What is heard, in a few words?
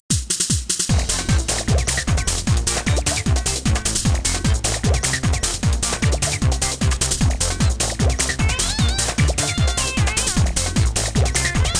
Battle; The Denpa Men; Android